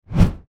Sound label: GUI Swipe